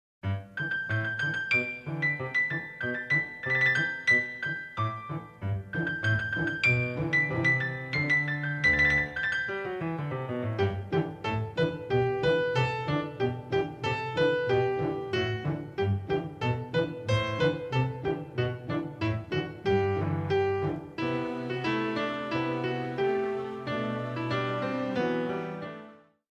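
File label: Yankee Doodle Piano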